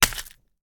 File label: Ice, Impact, Throw, Snowball, Step, Snow, Stalactite, Various; Mattia Cellotto; Crunch Mode